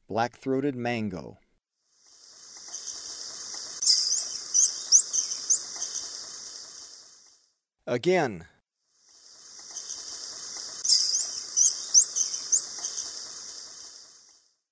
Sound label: Black-throated Mango; S. America; Eastern Lowlands